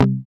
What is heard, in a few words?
E-Guitar; E-GUITARS